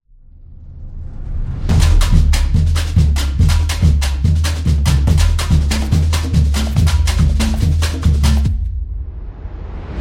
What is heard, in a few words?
Rio Samba Carnival Drums; tribal dance